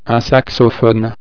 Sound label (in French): saxophone